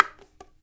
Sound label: BONGOS; InVision Interactive